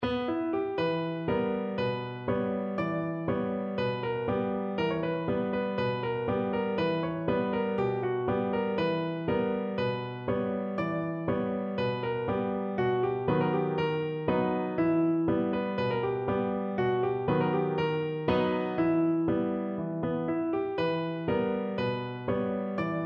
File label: piano